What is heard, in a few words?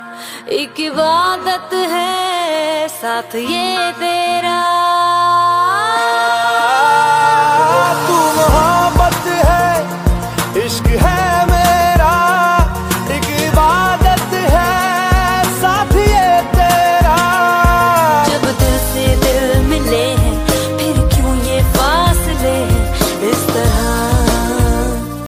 new bollywood ringtone